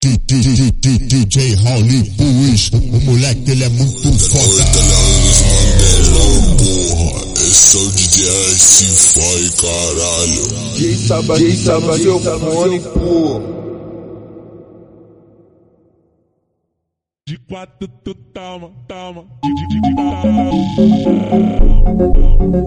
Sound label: chill slowed